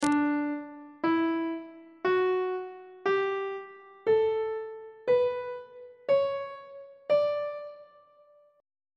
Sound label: d ionian mode note positions on treble clef